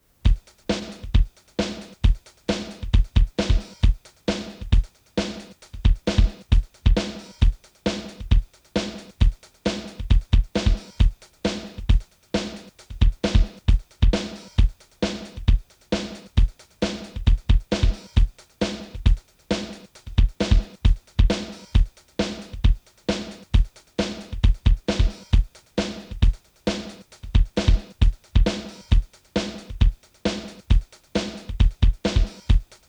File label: Drums; QY10